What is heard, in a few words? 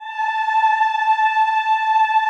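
choir A5, Aaahh; Sound & Vision - Gigapack I CD 2 (Roland)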